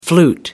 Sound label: flute